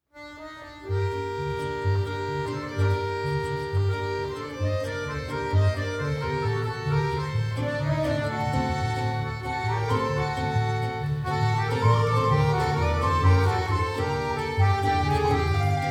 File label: Live Room Recording